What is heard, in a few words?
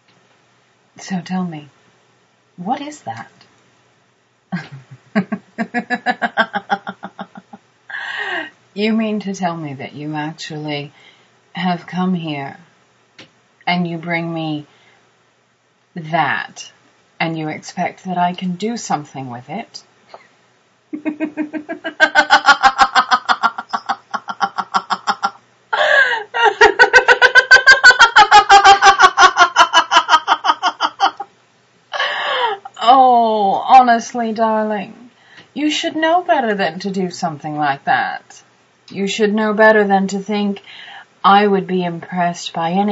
laughter